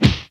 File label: player hit enemy; theGoonies; Retro